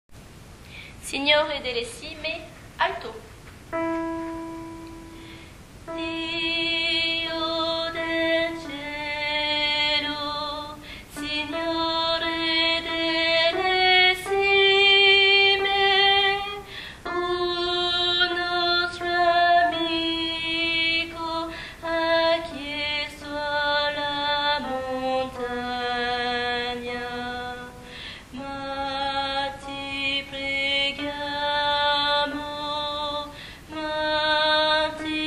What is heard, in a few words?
ALTO